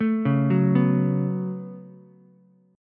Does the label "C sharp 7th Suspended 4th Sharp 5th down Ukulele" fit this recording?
Yes